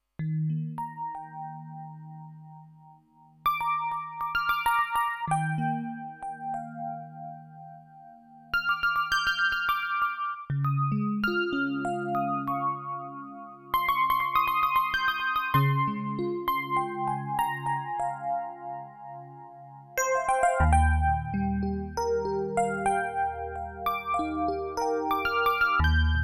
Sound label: Epiano